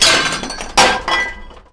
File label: BUMP, Anime Sound FX; COMIC